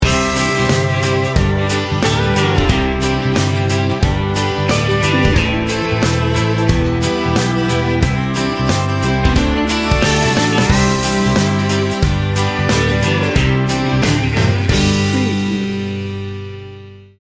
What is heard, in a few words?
country road